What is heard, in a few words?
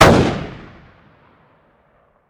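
weapon ar fire player atmo exterior; gmod; viper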